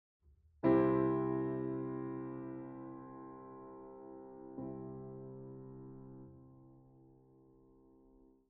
blackgrand test; pianoworks

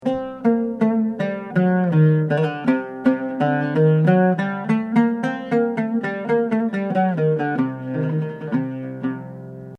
maqam nikriz C, oud

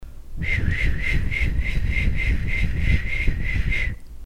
mouth noises, Human

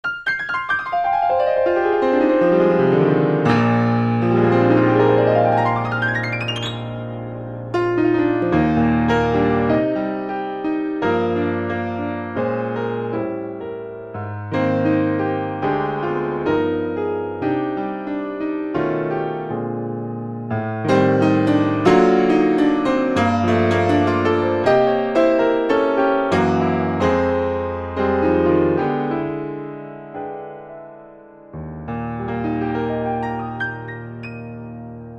Piano

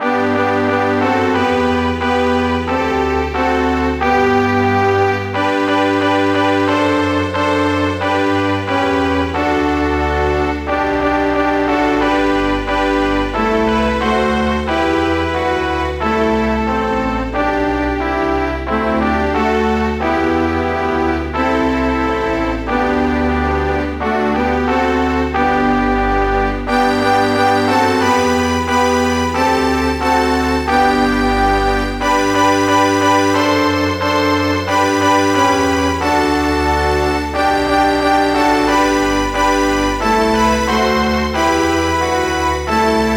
Zambian national anthem

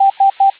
S morse code

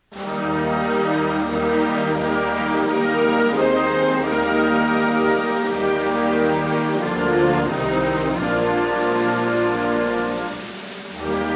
National Anthem of Palau by US Navy Band

